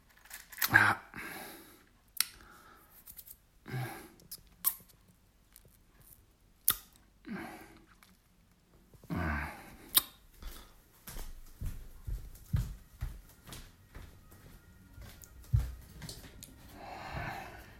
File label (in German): Tuch